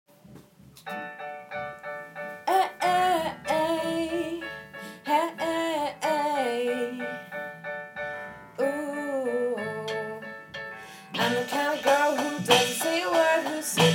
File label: intro and verse with backing track